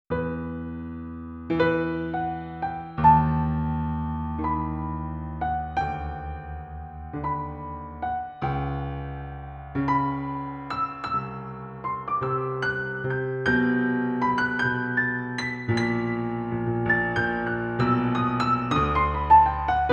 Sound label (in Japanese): ピアノソロ; La Danmaku Re-volutionnaire